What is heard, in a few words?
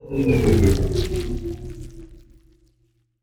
ant ship construction move; biogenesis